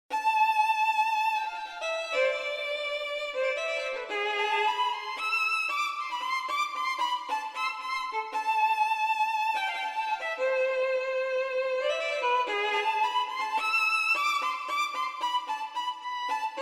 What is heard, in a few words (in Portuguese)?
violinos